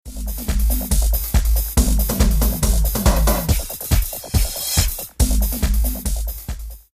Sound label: Dance